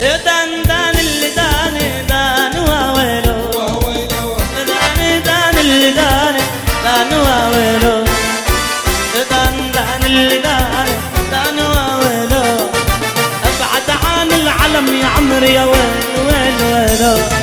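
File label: Is Calling R&B, ringtones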